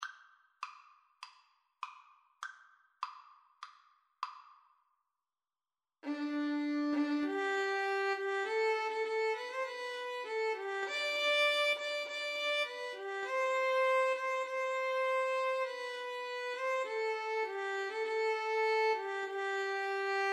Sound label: fireworks overture, violin cello; Violin-Cello Duet